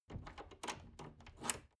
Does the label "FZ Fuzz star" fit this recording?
No